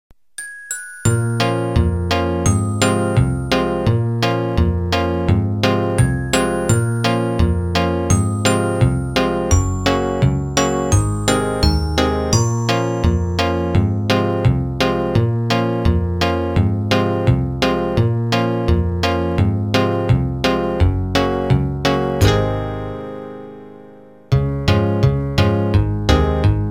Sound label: country